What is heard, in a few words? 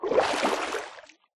swim, liquid; Minecraft